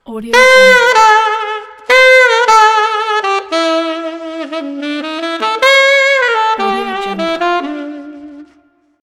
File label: saxophone logo